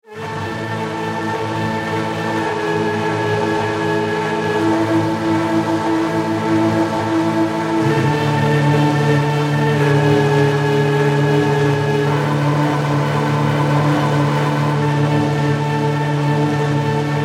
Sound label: transition; glaube